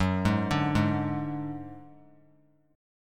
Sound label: Gb Suspended 2nd Sharp 5th 3C,3F,3A,3D; Gbsus2#5 arpeggiated